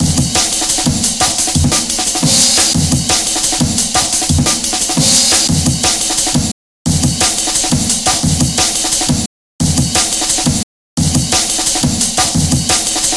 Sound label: lazers drums; PS4 Switch Windows Xbox One; Horace; gamerip 2019